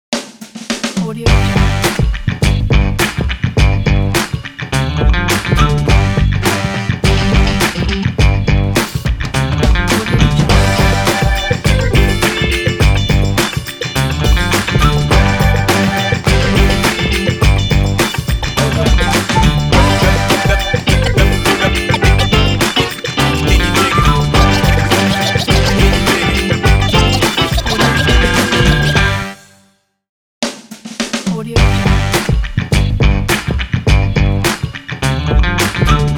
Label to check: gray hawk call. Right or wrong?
Wrong